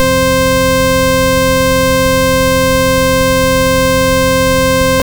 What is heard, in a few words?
sinus, synth